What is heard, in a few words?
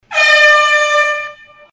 airhorn single